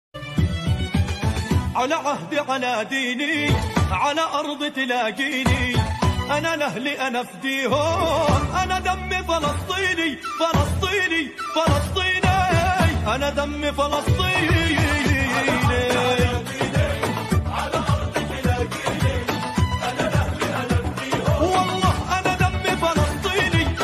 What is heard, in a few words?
palestine ringtone islamic ringtone arabic ringtone